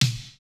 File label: snare, snare house